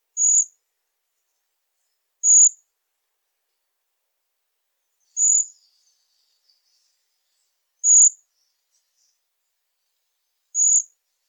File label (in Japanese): kibashiri; 地鳴き; 全長 13.5cm 渡り区分 留鳥／漂鳥